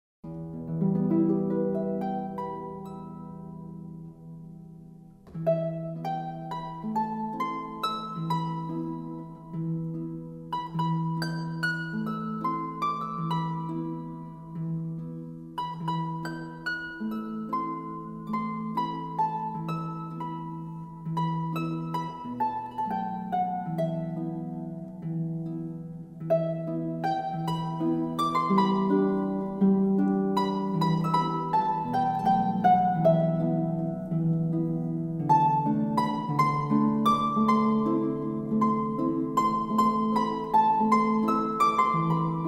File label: Jewish Liturgical Music, Psaume 23 (Harpe)